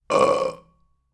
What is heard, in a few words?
Man Burping